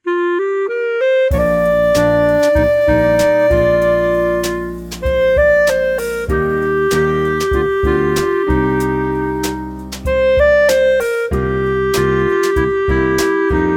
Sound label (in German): Jazz Club Klarinette